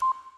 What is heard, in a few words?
BUTTON CLICK; solitaire haven